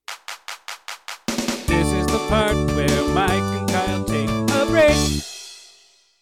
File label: Break Song